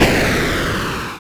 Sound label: explosion